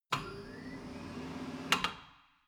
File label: tire changing machine turning platform latch